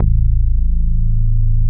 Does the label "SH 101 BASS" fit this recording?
Yes